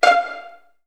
VN FX, Virtuoso Strings

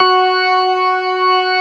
STOPS, ORGAN; Keith Emerson Signature Series

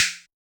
Yamaha DJX 2B, SD higher

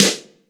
SN, KICK SN; AKAI S-Series CD-ROM Sound Library VOL-2

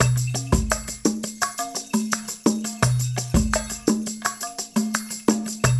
loop, percussion

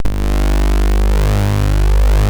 BRASS; Greytsounds VOL-1; STEIN-PARKER

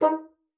bassoon, D#4; Xsample VOL-6

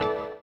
orient, bizarre guitar; Spectrasonics

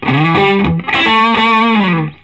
Vintage Blues Guitar, SOLO

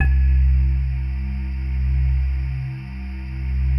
PAD, DIGI-PAD

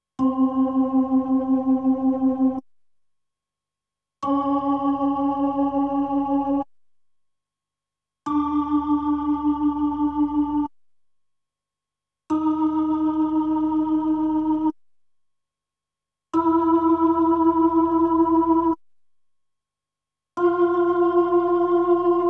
B3 Organ; Masterbits CLIMAX 8

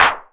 CLP, PERC; Wizoo - Platinum 24 Electronic

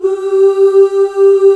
CHORUS G3; ENSMBL; AKAI S-Series CD-ROM Sound Library VOL-8

